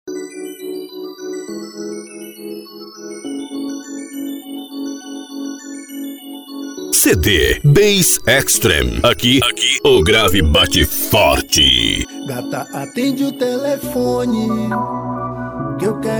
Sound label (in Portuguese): hip hop; cd bass extreme